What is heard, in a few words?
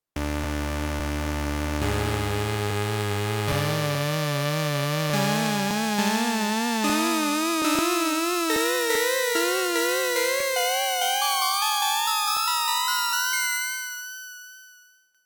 urgent rising sequence accelerating